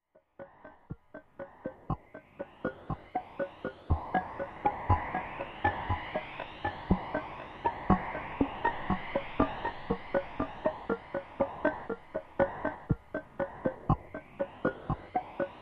Arp Texture; Beds

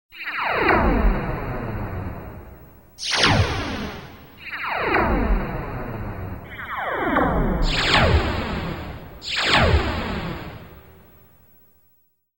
laser hits; SOUND EFFECTS1

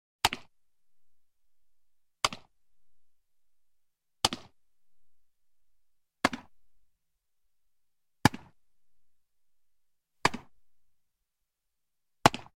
Various Baseball Mitt Impacts Without Decay; Sports

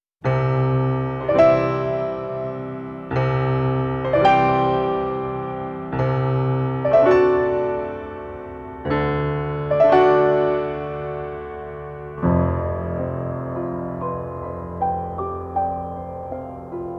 Piano Reprise

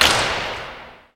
SLAP SHOT, SPORTS; 1000fx

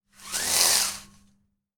BLIND; 1000fx

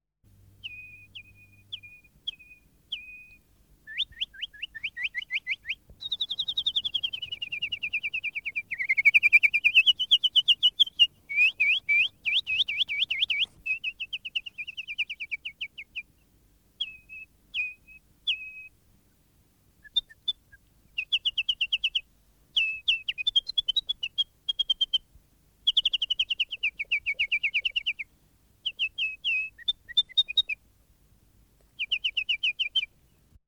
Nightingale; SOUND EFFECTS1

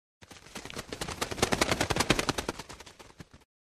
Bird Wing Flaps; Hollywood Edge Foley Sound Library

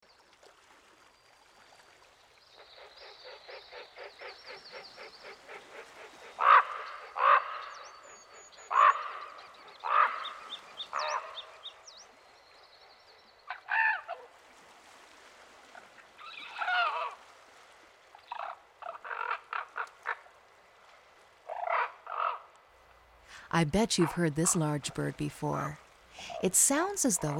common raven

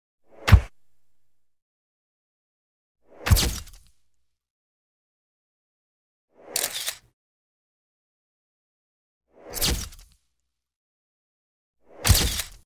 Camera Picture Shot with Whoosh x5; Hollywood Edge Sound Designers Tool Kit

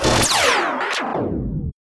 whoosh; Whooshes and sweeps

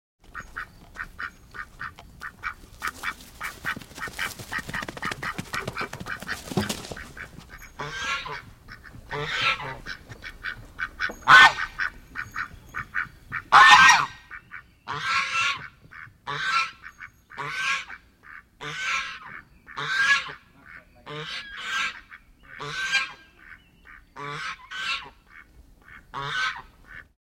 Geese Honks with Movement; Birds And Animals

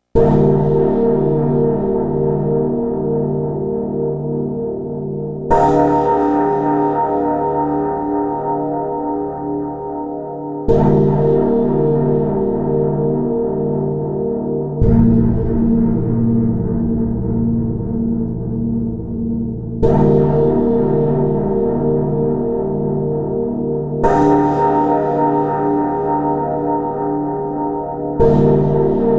Drone; Best Of N2O Library; Drones & Foundations

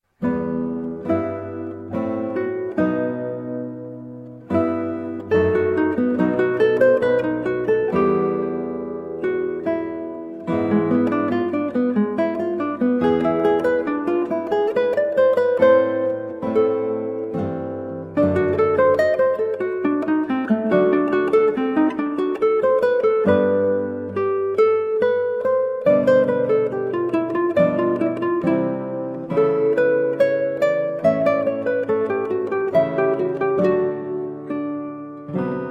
Grave, on guitar; Flute Sonata in E Minor HWV 375; Handel

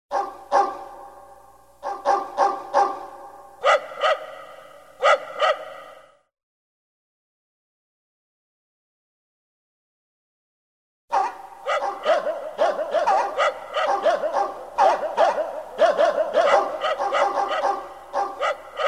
different dog barks; SOUND EFFECTS1

